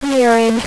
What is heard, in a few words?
CANARYF